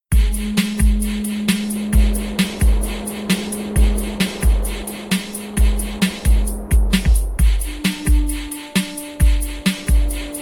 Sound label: Island chant